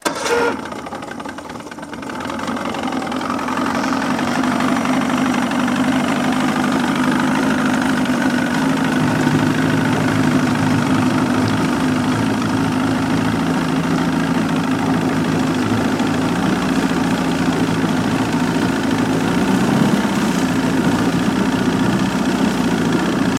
115 hp Evinrude Boat Away Loop Start, Drive Mono

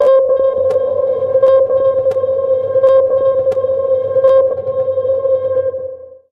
Computer Danger Warni, stinger

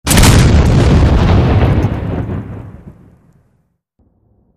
Bunker Explosion

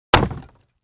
Crate Palette Type 2 Hit with Sledge Hammer; boxes & crates

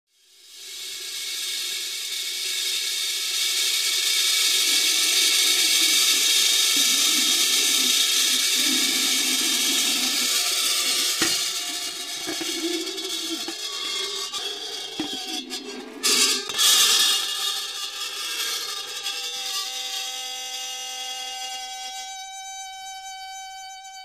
Long Scrape High Freq, crashes

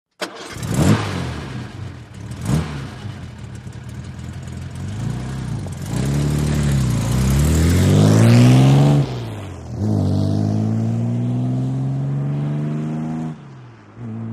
VEHICLES ASTON MARTIN: EXT: Start, depart, fast.

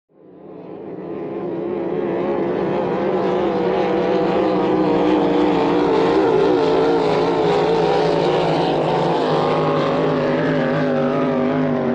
Hydroplane By Light

